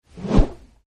woosh in; Wreck-It Ralph

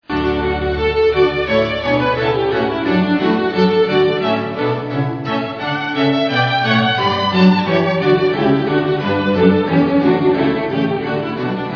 music; disney channel; playhouse; little einsteins

